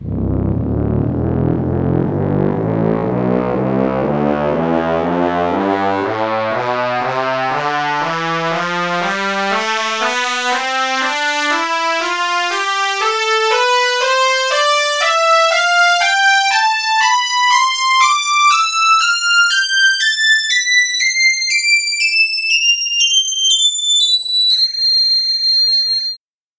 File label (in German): brass section tonleiter